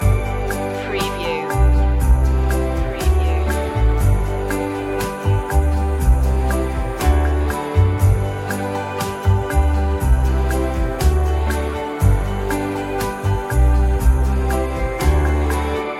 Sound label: loop